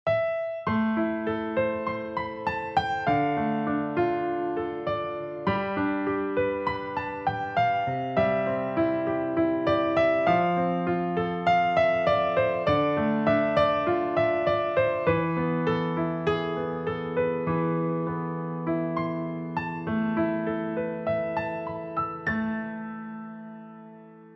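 romantic soothing beautiful lyrical piano background sound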